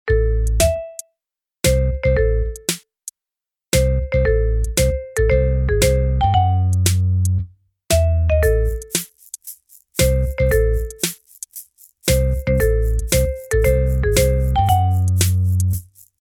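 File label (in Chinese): 背景音乐（短）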